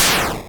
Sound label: 8bit crash